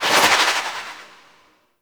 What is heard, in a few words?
Bullet Jump; Warframe; Fairy